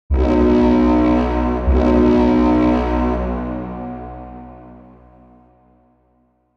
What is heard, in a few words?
deep foghorn sound effect